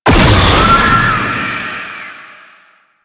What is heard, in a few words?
Unhallowed Curse Hit; Warframe; Warframes; Dagath